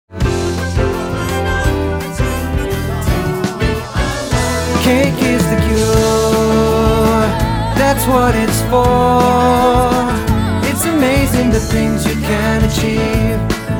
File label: ensemble male vocals